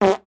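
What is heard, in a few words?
Cartoon Farts High Pitch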